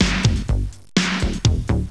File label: SYNTH BE